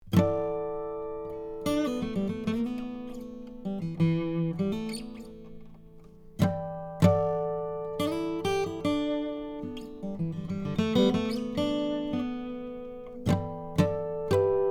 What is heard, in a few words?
Improv, vintage AKG C414 COMB condenser; SANTA CRUZ OMPW; HYPERCARDIOID